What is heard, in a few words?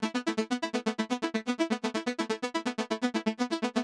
125 Blipp E, Keys, Nu Jazz House; PowerFX - Nu Jazz House